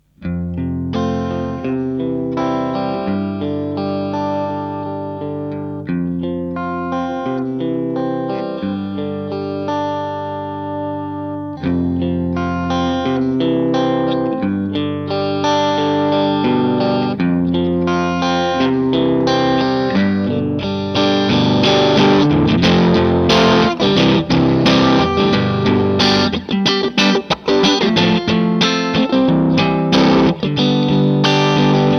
strat neck middle almost clean; ad30tc

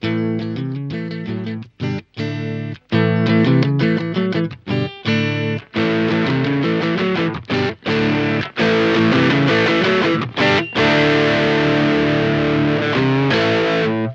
clean bd2 sd1 both; tech21

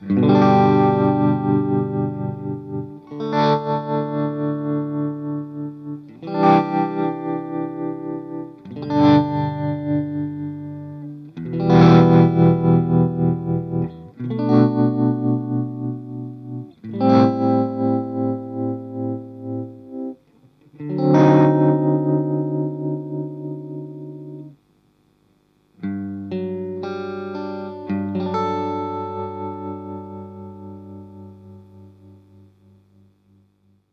manual tremolo; ad30htc

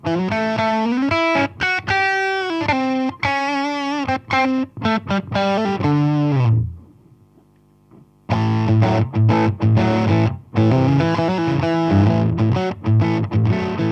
stereo 2 amps clean & dirty

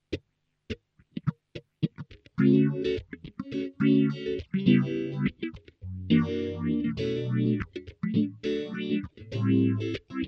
strat neck gt2, phaser; coron phaser55

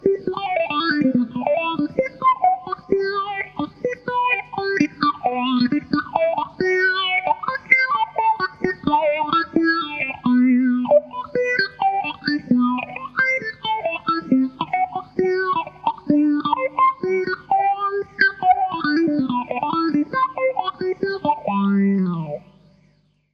vox wah

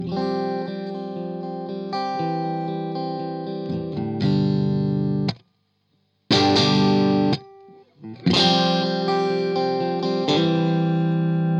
12ax7 strat neck middle; bad cat black cat

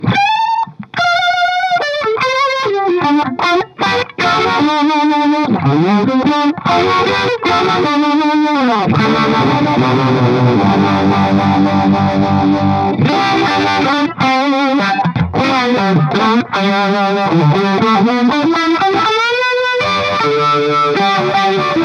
orange 335 more gain; rotovibe

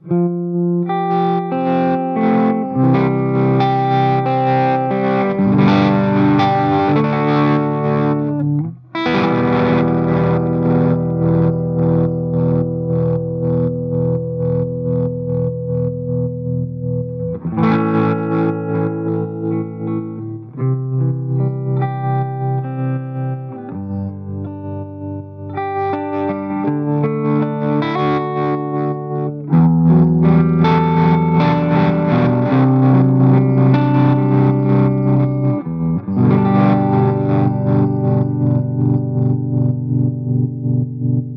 dirty 335 neck; uraltone

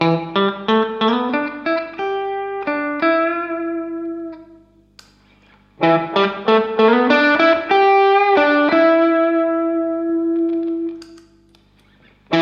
sparkle tubeking; ad30tc

